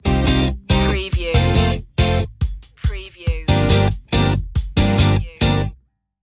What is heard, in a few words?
full city stinger